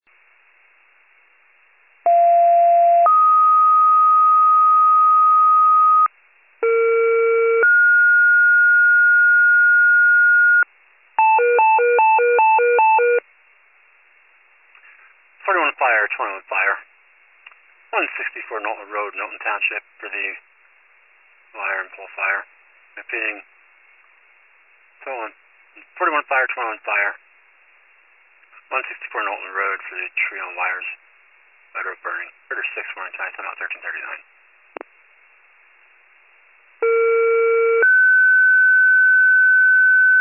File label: fire, pager alert